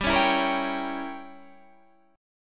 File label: G Diminished 7th, Guitar, Standard; strummed; JGuitar